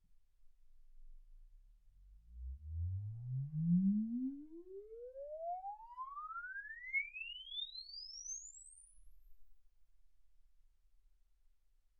exp swept sinus 10Hz 24kHz

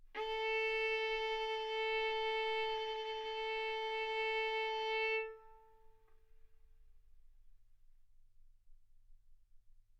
Violin arco mf sul A A4 B4 stereo

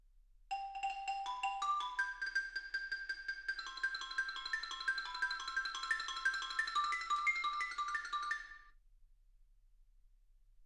xylophone

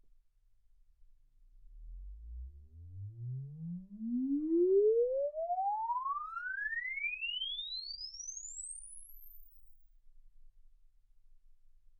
exp swept sinus 10Hz 24kHz

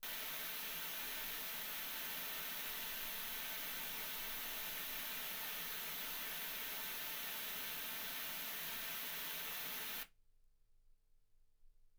wgn 48kHz

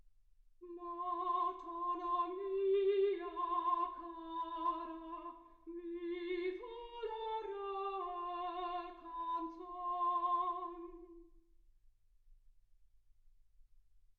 soprano

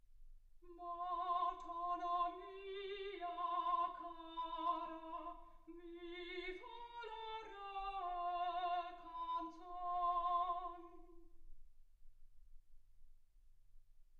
soprano

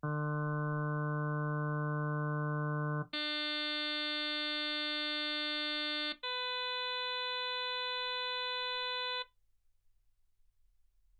harm sinus 48kHz

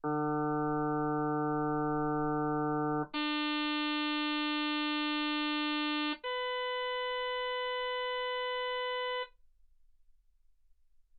harm sinus 48kHz

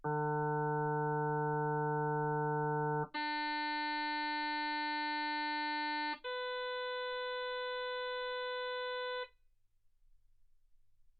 harm sinus 48kHz

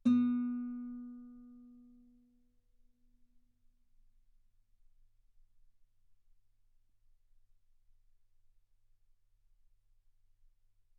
Guitar ff B3; sul B

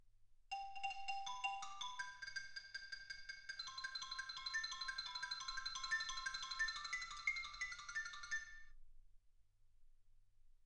xylophone

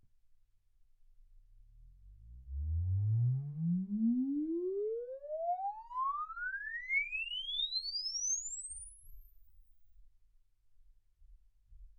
exp swept sinus 10Hz 24kHz

